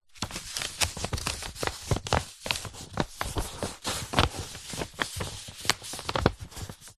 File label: paper x5 sheets a4 flat handling gentle rustle; foley; zapsplat